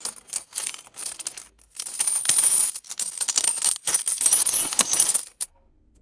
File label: metal coins clinking and rattling; game